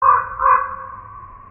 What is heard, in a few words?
Alarm, car; cuervo